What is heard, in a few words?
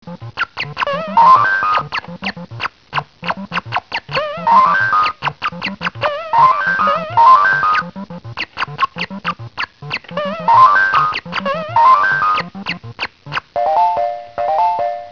walk jump